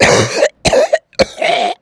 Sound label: cough; z-lapik ru